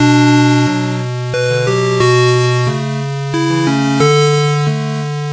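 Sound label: 8bit square melody, 90 bpm; SYNTH AND ELECTRONIC LOOPS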